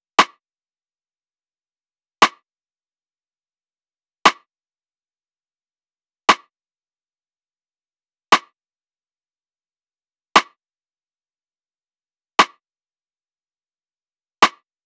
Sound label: Drum Loop, 118 BPM Rim; Loop Stems; Cymatics; Vibes II Drum Loop Collection; How So Drum Loop